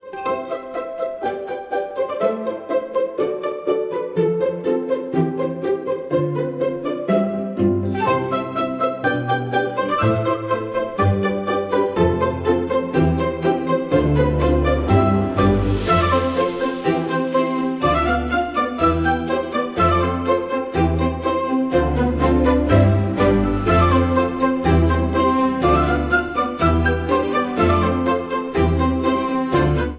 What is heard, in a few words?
marshmallow